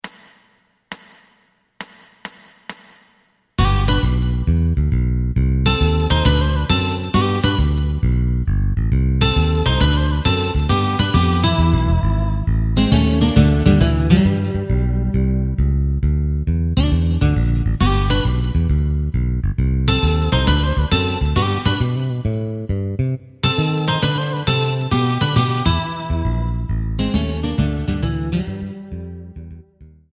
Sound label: drum; エレキバンド用カラオケ